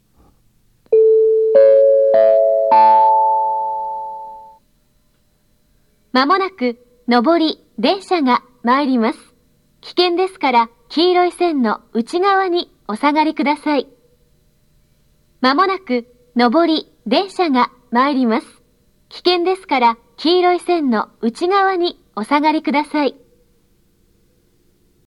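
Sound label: YRP Nobi approach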